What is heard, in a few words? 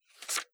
page flip